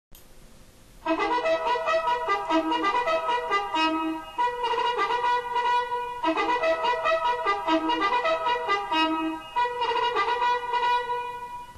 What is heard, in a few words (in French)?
Rassemblement art, Sonneries reglementaires